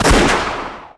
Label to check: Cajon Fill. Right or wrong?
Wrong